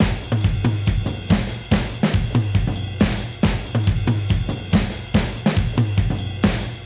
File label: Modern Breaks; Touch Loops; ClassicBreakSessions